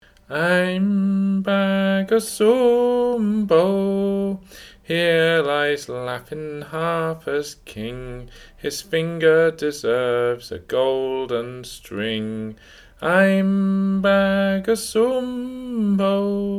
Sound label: melody